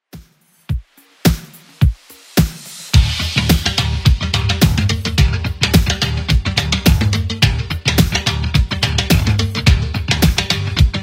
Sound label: 80s Redrum 107bpm; remixplanet